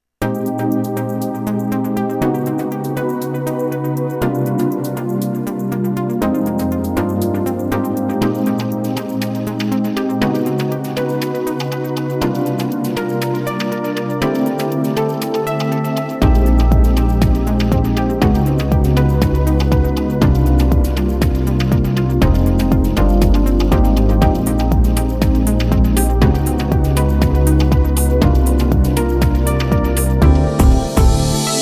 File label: Lounge Music